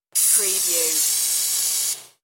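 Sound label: Compressed Air Quick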